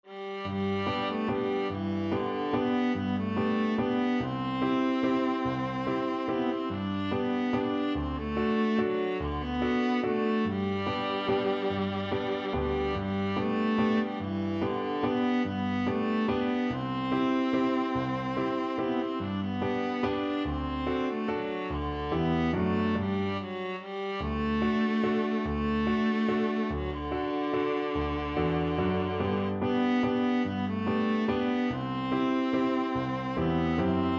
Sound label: wild rover, viola